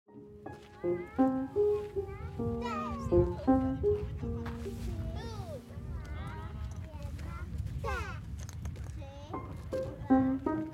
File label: harmonica